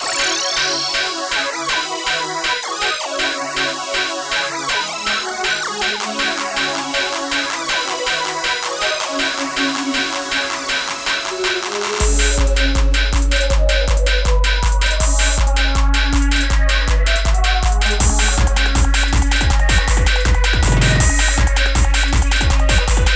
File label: Amens Marimba